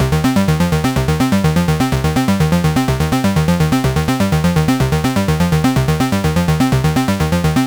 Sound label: Model D Arp